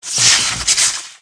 Electric; enel; battle

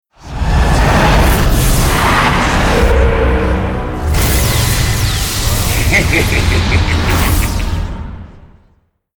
mega skill; hero sound; gengguimega

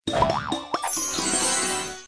draw card one

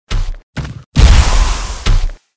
attack; hero

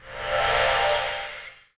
skill buff

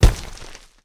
effect tu

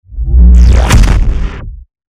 attack; hero

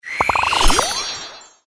attack; hero

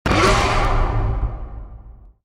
roar, battle

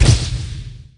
hit, battle

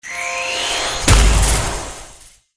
attack; hero sound

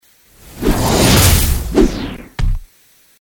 attack; hero

